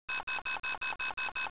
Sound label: c4 beep; cstrike